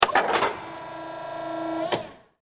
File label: Industry Hi Lo Motor Operate; Motors; Machines; SFX Bible